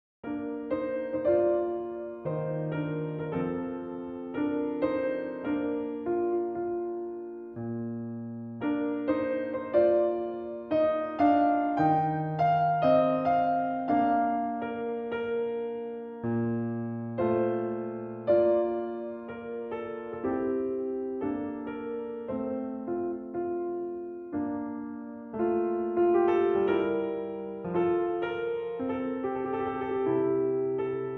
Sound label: Capriccio in B-Flat Major, Arioso; BWV 992 I.